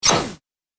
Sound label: Droid Flyby Shot, robot; Robot Sound Effects